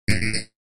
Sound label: Bullet Time Whip Reve; Bullet Time Whip with Reverb